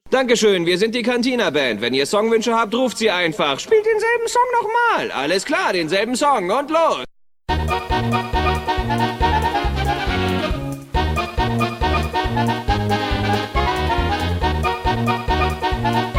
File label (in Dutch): kantineband